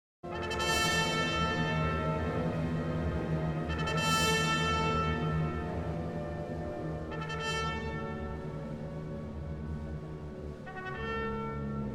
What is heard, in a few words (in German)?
Sinfonie Nr. 5 cis-Moll, 1. Satz Schluss; G. Mahler